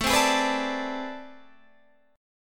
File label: A Minor Major 11th, down, Guitar, Standard; strummed; AmM11; JGuitar